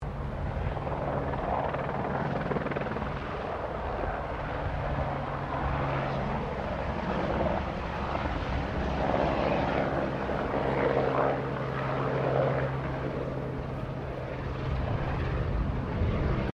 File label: Helicopter Bell 204 firefighter flying distant, Arial firefighting, small bushfire; Helicopters